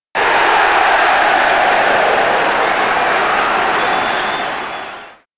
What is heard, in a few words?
big cheer